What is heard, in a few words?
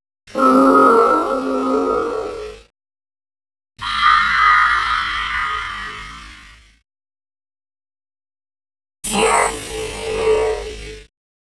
slowmo enhanced half speed